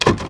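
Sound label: UI Menu Open; Inotia 3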